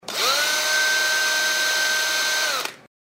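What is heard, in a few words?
cordless drill new; crdles drill new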